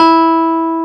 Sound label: clav E4; AKAI S-Series CD-ROM Sound Library VOL-2; CLAVI